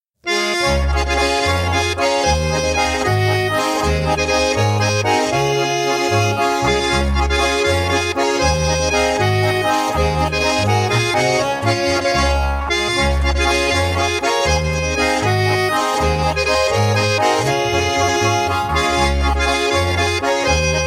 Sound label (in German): Walzer